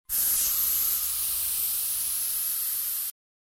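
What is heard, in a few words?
sound of a tyre deflating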